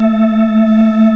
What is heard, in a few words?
LESLIE